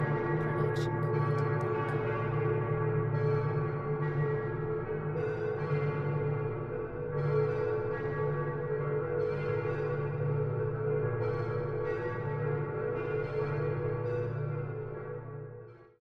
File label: wedding bells; soundscrate